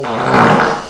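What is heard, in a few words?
wet fart with reverb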